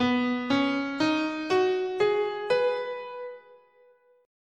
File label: B Major Pentatonic, scale